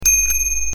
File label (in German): Fahrradklingel Lenkerend messing